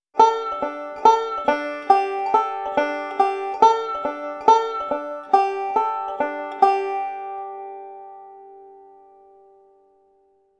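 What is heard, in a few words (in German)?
bending mit foggy mountain roll, banjo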